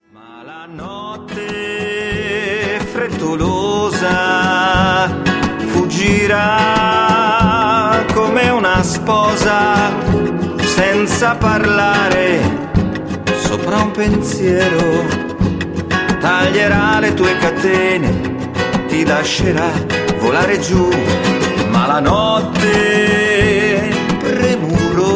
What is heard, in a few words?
vocal